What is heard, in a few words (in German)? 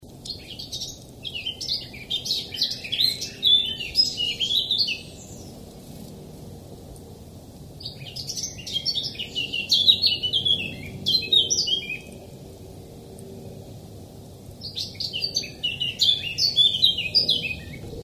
Zaunkoenig